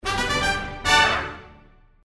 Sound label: symbol trigger; Farm Mania; Happy Farm